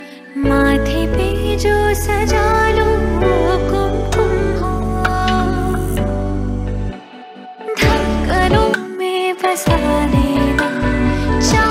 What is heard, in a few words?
ringtone, bollywood ringtones